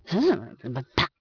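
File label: female angry; People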